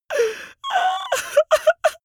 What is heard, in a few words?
male crying; comedy-cartoon; soundpool